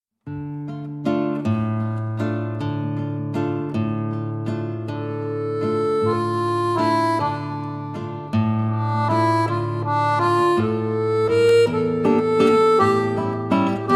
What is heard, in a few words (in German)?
Walzer